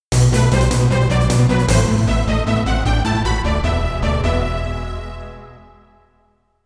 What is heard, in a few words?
Mission Failed; Metal Gear Solid Virtual Existence